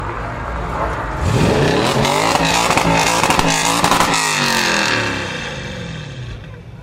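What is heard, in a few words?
sports car throttle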